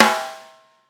big steel snare drum g sharp key

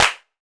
natural hand clap g sharp key; single hits one shots

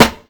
smooth snare sound a key; Duration 0:01; Mono

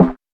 clear snare drum sound f key; snare-drum-samples

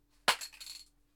opening a paint spray can; sound effects

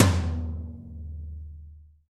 big tom single hit d sharp key; tom drum; tom drum samples

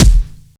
verby bass drum sound e key; tuned drums e key

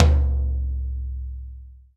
long tom c key

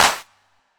clap one shot d sharp key; percussion; single hits one shots

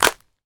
clap c key; percussion; single hits one shots

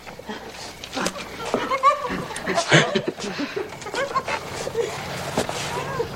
bed shaking laughter

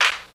clap one shot f sharp key; single hits one shots

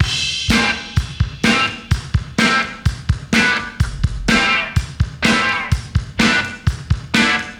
126 bpm 1990s pop folk breakbeat sample A key

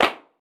smooth clap f key; percussion; single hits one shots

